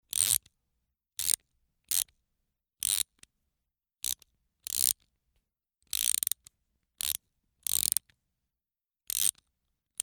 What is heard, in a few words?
safe dial